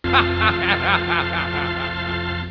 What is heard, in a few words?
laugh